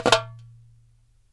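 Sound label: DARABKA roll, Mediteranean Traditions percs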